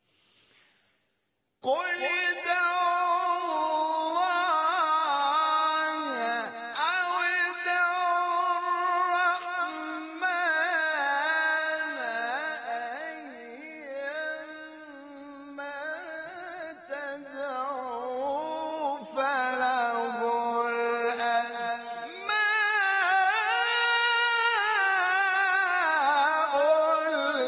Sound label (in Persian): تلاوت آیه سوره اسرا; آیه 110; حامد شاکرنژاد